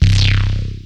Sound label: Hottbottom C0, Analog Synths; Ableton Foundation FreePacks